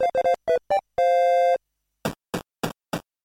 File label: start sound